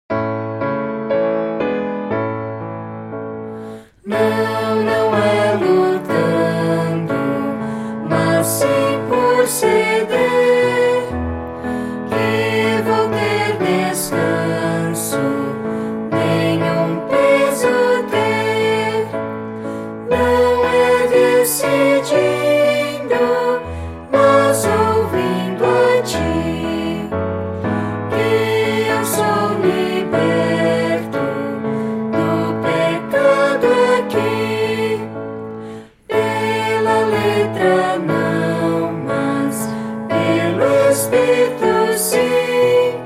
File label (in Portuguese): voz piano